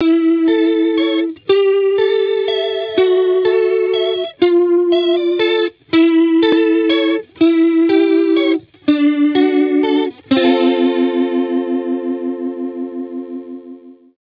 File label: Stereo Chorus